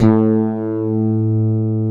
D10, Synth Bass; LA Syn Bass

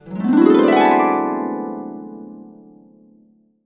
D, Harps; USB Soundscan vol.62; Complete Harps; AKAI

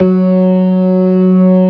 ORGAN, NO PERC

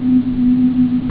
whole string; dragon; Amiga Soundtracker Sample Packs

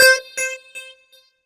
C5, SynthLine; 90 s Sample CDs; NiceBeats; SynthLine VOL-15

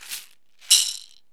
BASKET, MISC SHAKERS; Zero-G Ambient 1

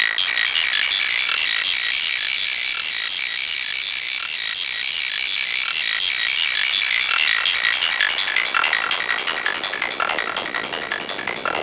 Synth Distorted; Electrocution

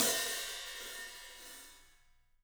ZILDJIAN HT; 3D Sound; 3D Drums

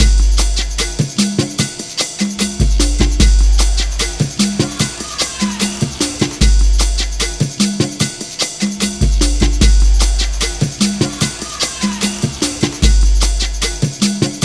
149.5 bpm; BUMBLEBEE; 90 s Sample CDs; Planet of the Breaks

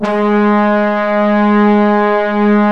BRASS G#2; Giga Samples Collection; SAX+BRASS

